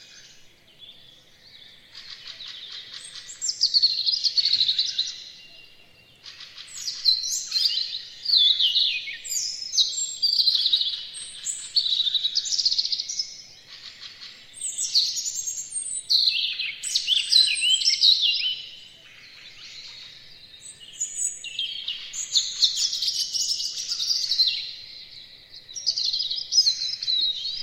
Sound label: robin chirping